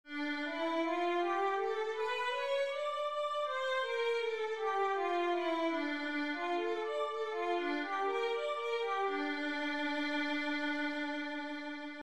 violin D minor, scales 1 octave

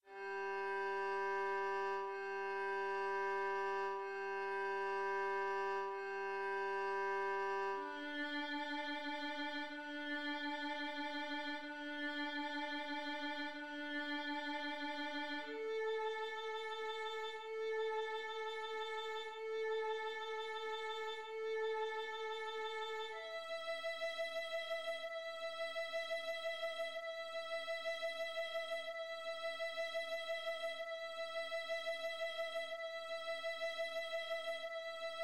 open strings whole notes, violin

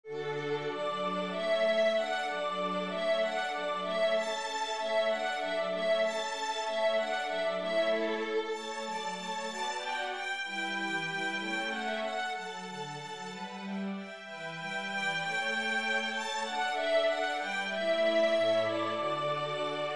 Water Music Full

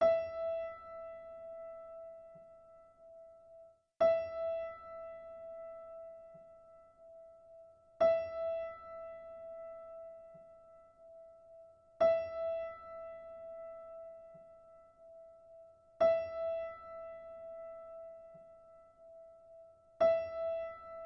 piano E

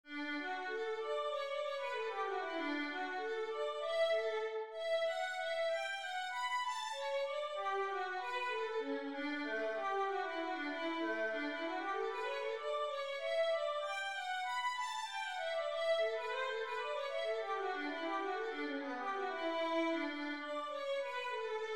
Brandenburg Violin

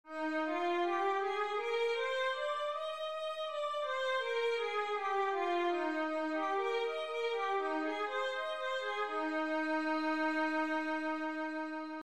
violin E flat major, scales 1 octave

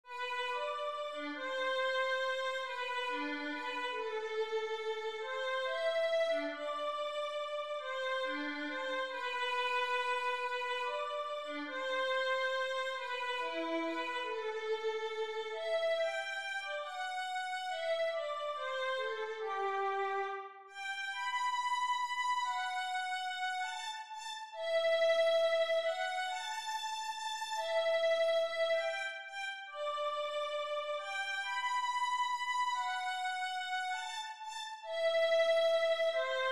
waltz violin

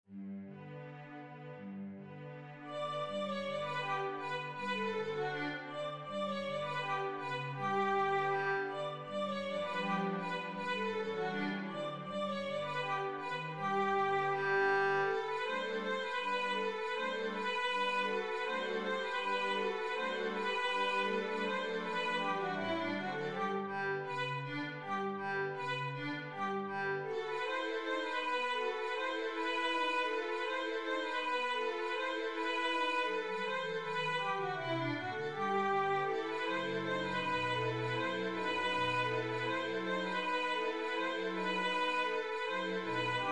halling full

